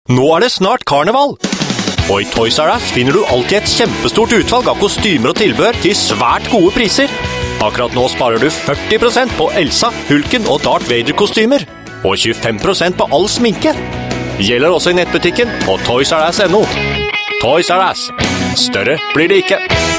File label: uke; ToysRus